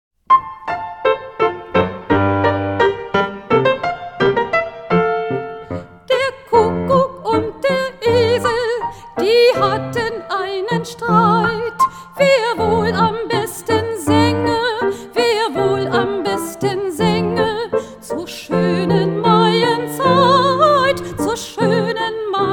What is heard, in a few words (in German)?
Der Kuckuck mit Gesang